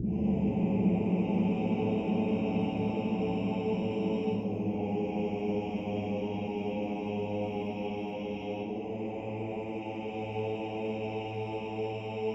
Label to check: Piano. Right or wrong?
Wrong